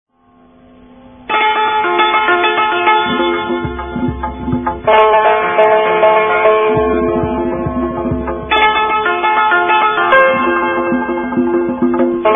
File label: Santoor